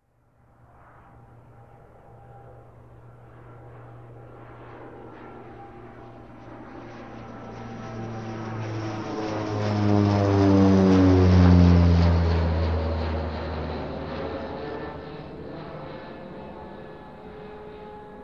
Turboprop passing by

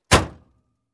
Closing Car Door

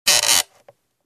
Bright wooden Squeak

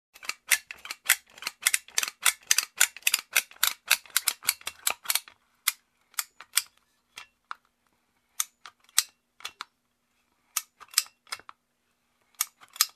Staple Gun Rapid to Moderate Usage

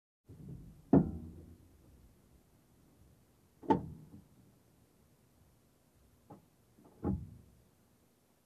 Noises outside of car

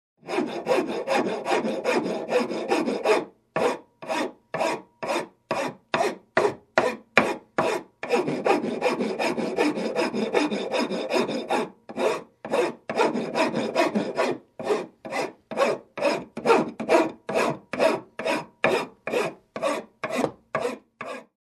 Hand Sawing Wood

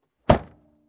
Car Door Close

